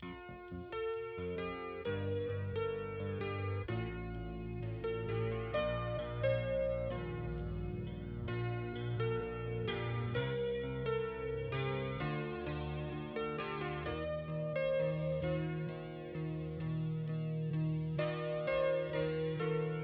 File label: Piano Break